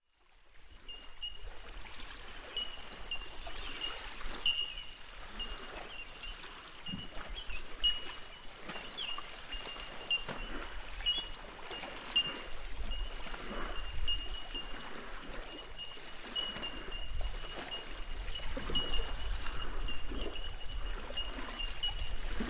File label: Bell Birds